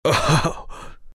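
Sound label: laugh, Chinese; Chen; dota2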